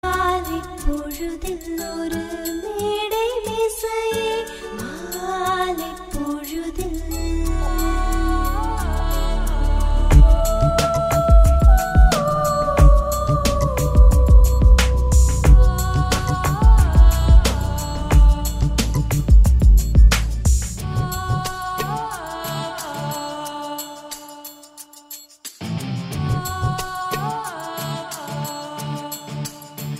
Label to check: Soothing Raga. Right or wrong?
Right